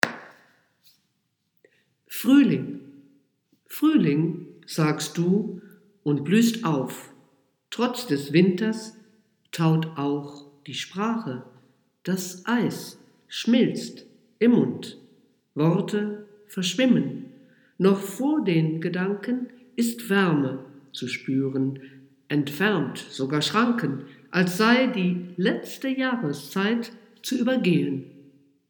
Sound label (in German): Fruhling, voice piano; deutsch